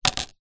transport drone propeller down on wooden surface dji phantom 3